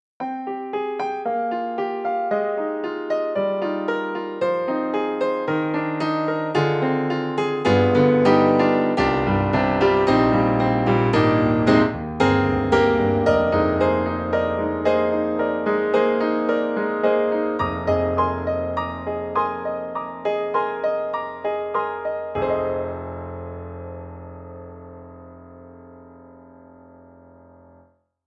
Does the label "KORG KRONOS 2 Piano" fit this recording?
Yes